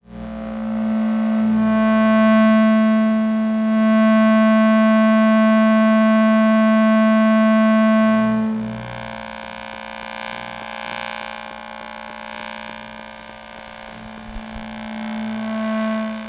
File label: lcd screen